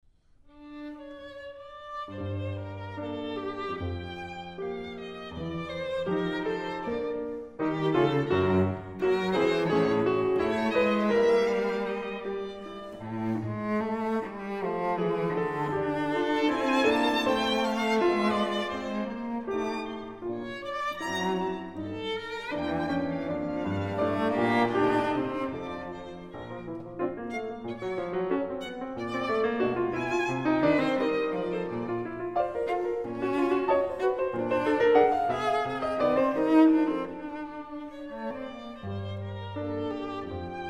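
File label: piano trio; d'Indy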